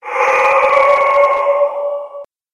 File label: monster death